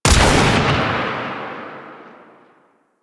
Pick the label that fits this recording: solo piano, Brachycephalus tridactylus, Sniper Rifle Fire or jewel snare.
Sniper Rifle Fire